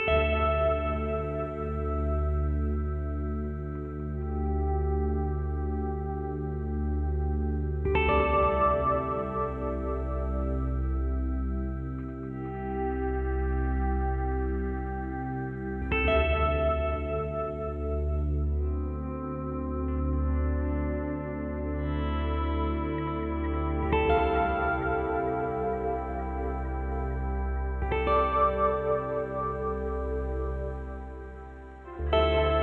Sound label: Positive Ambient